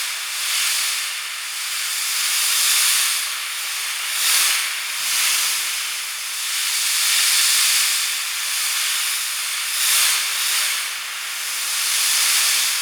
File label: Invisibility Smoke Loop; Warframe; The New War; Drifter; Abilities